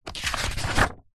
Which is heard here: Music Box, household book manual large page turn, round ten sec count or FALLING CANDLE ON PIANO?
household book manual large page turn